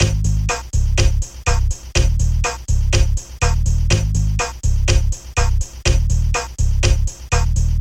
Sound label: Tropical Beat without Piano or trumpet; JuniorTheBeatMaker